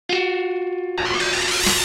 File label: PICKUP; Marc Ecko's Getting Up: Contents Under Pressure; XBOX